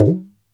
gumki, mrid

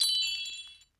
bell; eddyflux

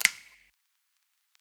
snap; eddyflux; dough-samples

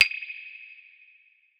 perc; eddyflux

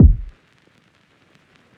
kick; eddyflux; crate bd; dough-samples

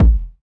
MPC1000 HOUSE BD; drum machine

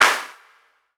clap; eddyflux; dough-samples

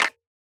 clap; eddyflux; dough-samples

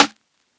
snare; dough-samples; eddyflux

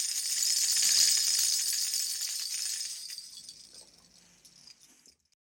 rattle; eddyflux

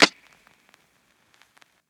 snap; eddyflux; dough-samples

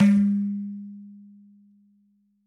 dhum, mrid

